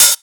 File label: hat